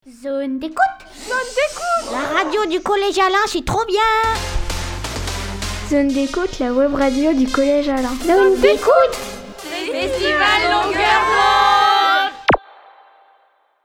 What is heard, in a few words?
jingle